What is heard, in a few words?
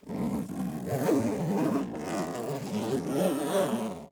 zipper normal